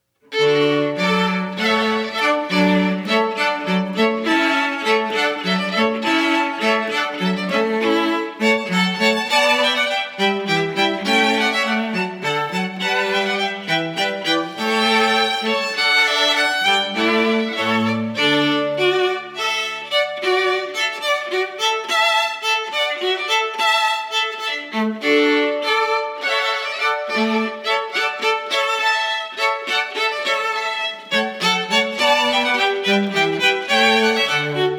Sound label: hornpipe, strings